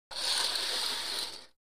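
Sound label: Poker Chip Movement; casino games; chip mvmt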